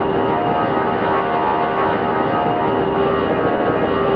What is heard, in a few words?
cfm buzz Dist, CFM56 external; A32X